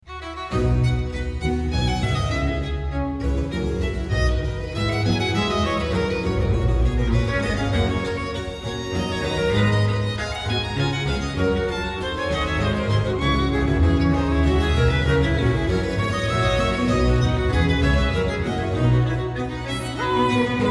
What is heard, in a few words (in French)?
cantate, air choral sop; BACH; bwv 51